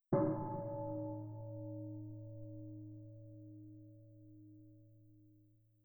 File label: bell; Netease